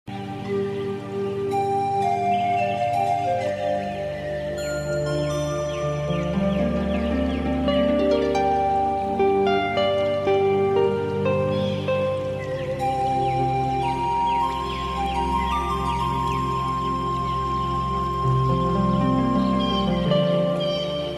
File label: Relaxing Tone